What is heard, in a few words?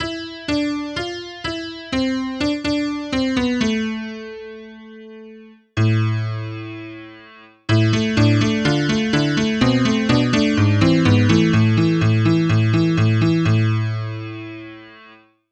honky tonk